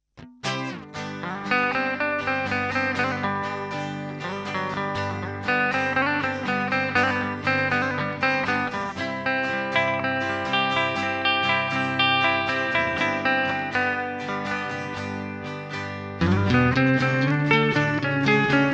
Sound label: HotLine2 clean melody